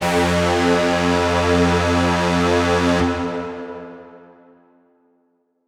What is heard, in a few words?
lead dumb F; leads